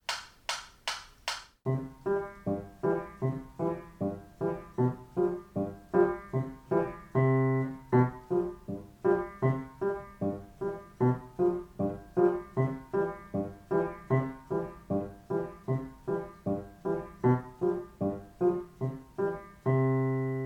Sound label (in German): Hopp hopp hopp Lehrerbegleitung PLAYALONG